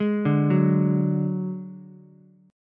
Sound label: F sharp Suspended 2nd Sharp 5th, Ukulele; arpeggiated; JGuitar